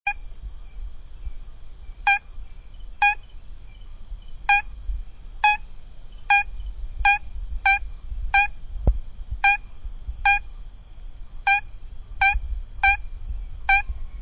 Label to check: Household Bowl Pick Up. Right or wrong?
Wrong